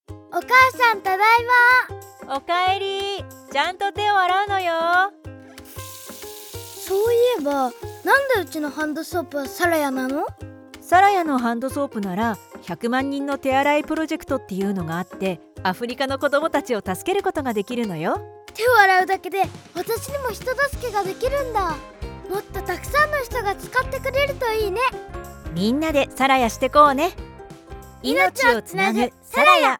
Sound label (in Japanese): BGM; サラヤ1月